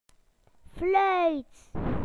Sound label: whistle